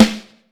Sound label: Vintage Snare Drum; Q Up Arts; Johnny.C's Vintage Snare Drum; 5X14